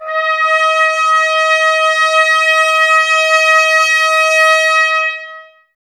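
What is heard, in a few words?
Brass trumpets D#, Mute; Miroslav Vitous - Woodwind Brass Ensemble; Tps Mute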